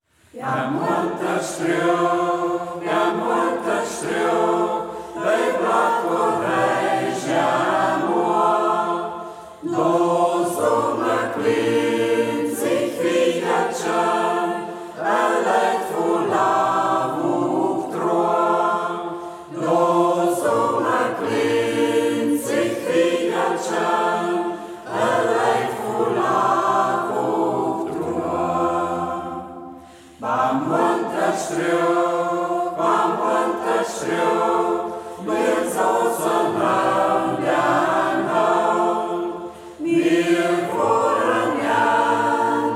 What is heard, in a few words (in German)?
singkreis; Ortsmundart: Braller; Äm Honterstreoch; Singkreis Kampestweinkel; Hegt wird gesangen